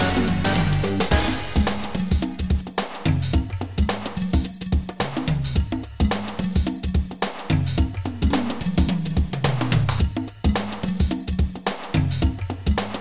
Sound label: Menu Theme; Jazz 2